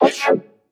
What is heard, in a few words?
Stab; neuro; InsideInfo